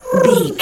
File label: pigeon call calm